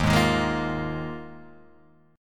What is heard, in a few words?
D sharp Suspended 2nd Suspended 4th, Guitar, Half Step Down